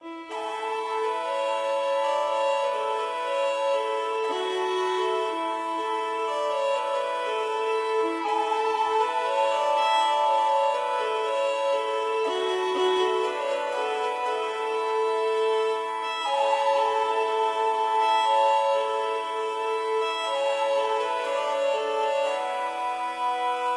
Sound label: Music Ireland Calm